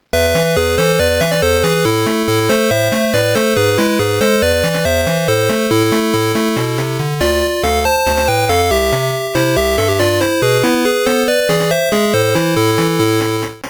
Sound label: SN76496 tetris